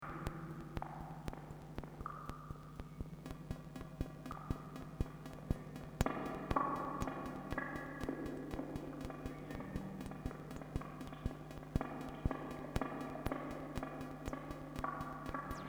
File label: hall; sys700